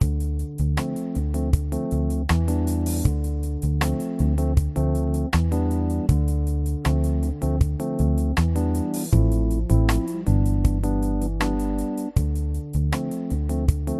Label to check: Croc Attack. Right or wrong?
Wrong